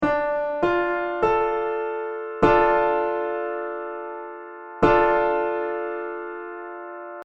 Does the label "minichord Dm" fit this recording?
Yes